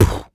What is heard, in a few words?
Human Beatbox, Beatbox Hits; Loopmasters; Vocal Hits And Kits; Human Beatbox 2